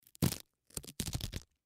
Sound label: Bandage Tape Rip From Roll Slow; First Aid; Hospital; Emergency